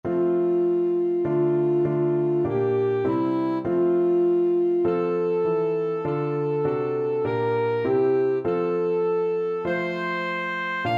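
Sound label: fairest lord jesus alto saxophone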